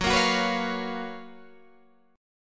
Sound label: F sharp Major 11th F sharp, Guitar Standard; strummed; JGuitar